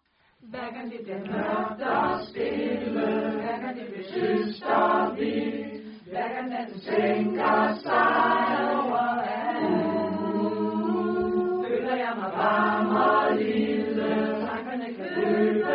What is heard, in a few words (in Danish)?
acapella